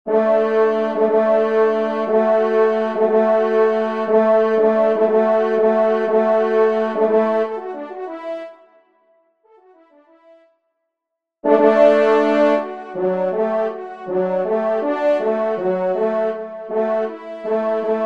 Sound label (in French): trompe; Helene a Mme Minie; E Caneaux